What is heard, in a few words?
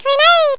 grenade; Zoom - Release 2; Active Software; worms